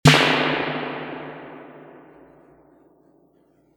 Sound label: reverb tank 3 spring medium drum machine snare